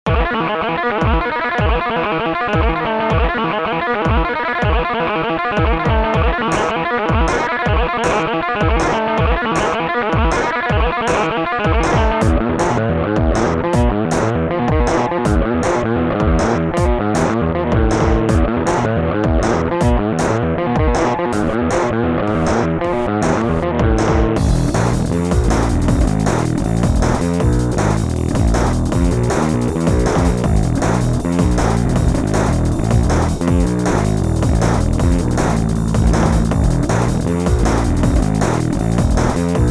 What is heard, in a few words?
Guit dnb